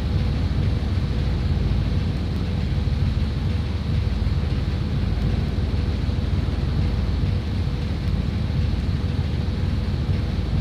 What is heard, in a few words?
idle, vehicles cars; lwcars